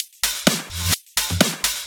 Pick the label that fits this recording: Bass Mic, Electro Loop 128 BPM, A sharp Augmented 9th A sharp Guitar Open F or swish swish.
Electro Loop 128 BPM